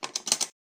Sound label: lock open